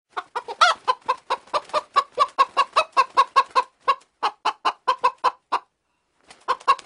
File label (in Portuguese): som de galinha